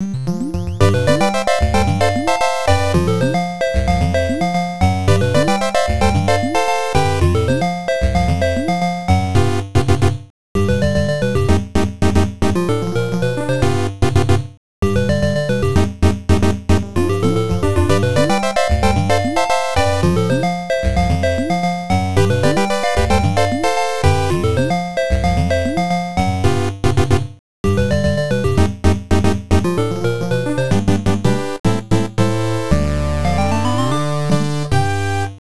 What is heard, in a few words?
Salsa; galaga 88 tg 16